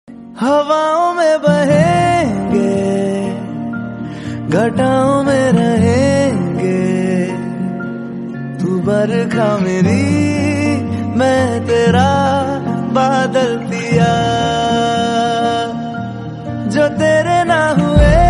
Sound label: Hindi romantic